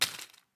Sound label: Shell 39mm leaves; STALKER; IWP